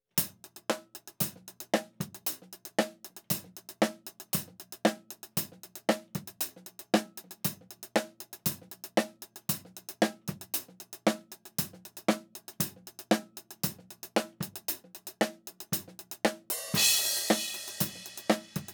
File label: Hat